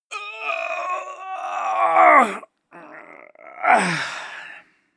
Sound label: groan stretch; koerpergeraeusche; menschen; soundpool